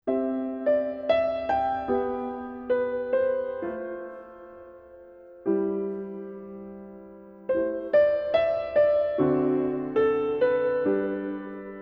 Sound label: Melody; Symphonic Rain; A Duet for Piano and Cello